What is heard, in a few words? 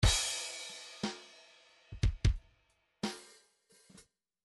midi file grooves 3 4